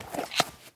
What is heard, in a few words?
grab clip; Rust